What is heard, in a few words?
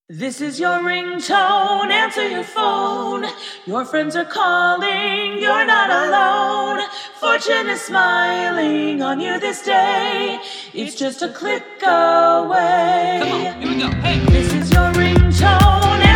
ringtone